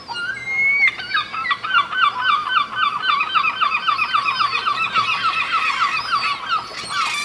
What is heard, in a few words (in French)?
mouettes